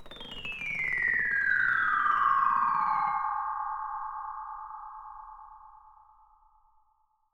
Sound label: aluminaphone; eastwest qlso